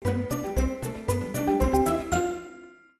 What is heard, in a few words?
Minigame Apple Tree; MLP Friendship is Magic (Mobile Game); My Little Pony OST Repository